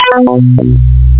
keysynth; Amiga 8-bit Sampled Voice; 8,000 sample rate

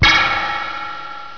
twang

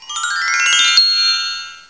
button click; SoftKey

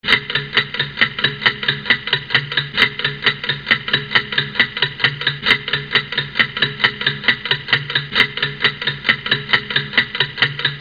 Clock

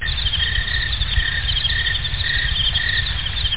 night sou; NATURE; Amiga 8-bit Sampled Voice

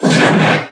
launch

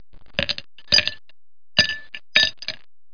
ice

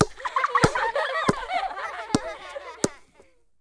kids ball, residential

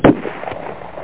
RIFLE; lethal-t

